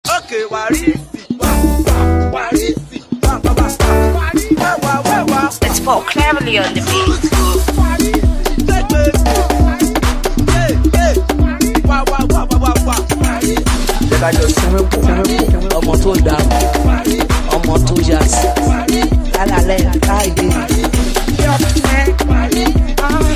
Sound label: Wa Warisi Dance Beat